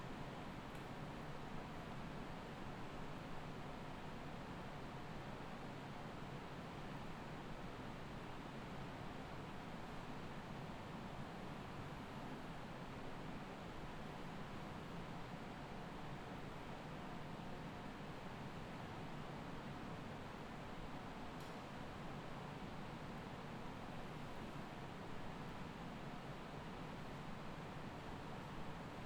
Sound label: BG Noise